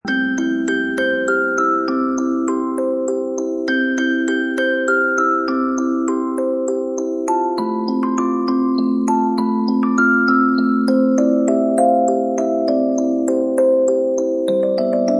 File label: music box; Winterfest mega music box